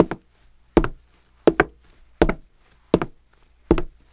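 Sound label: HARDWOOD, FOOTSTEP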